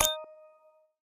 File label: win